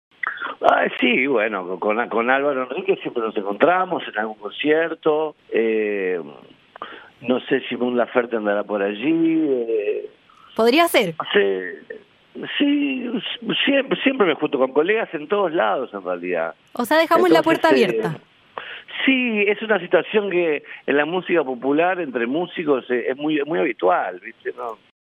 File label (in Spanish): cuña solo piano; cuña 3 artistas chilenos